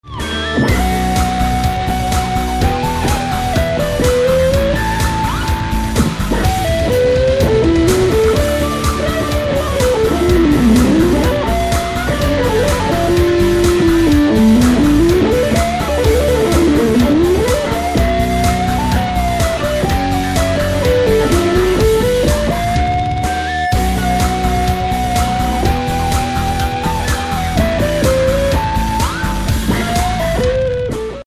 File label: Soundtest 6 string Viper dist